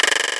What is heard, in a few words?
vibslap; cubic